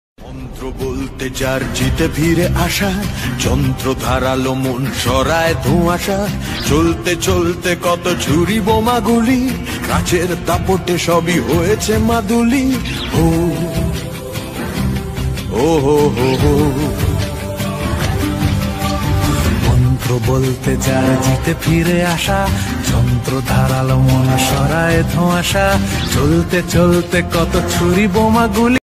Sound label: Bengali ringtone